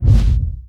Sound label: Monster Generic Foley Punch; gamerip